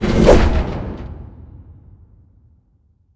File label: Screen Changer